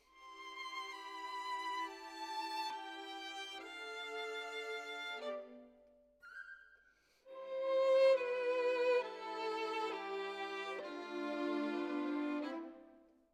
Violin Solo

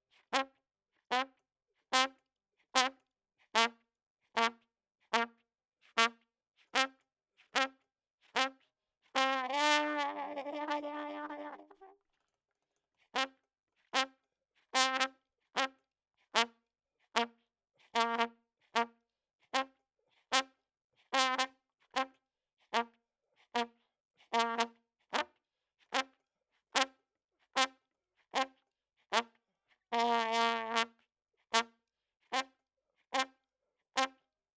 Trump

